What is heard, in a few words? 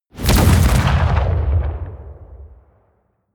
detonation